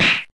hit spikes; Viking Escape

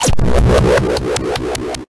boss incoming; Season Land

